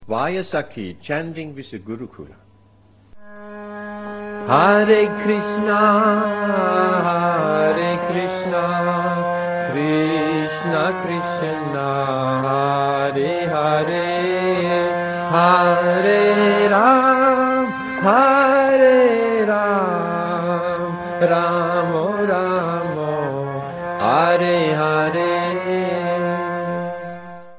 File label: chanting with the gurukula